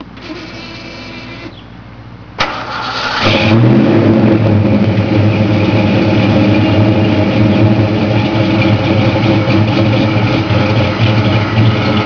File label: engine starts idles; The sound of a strong V8 engine starting and idling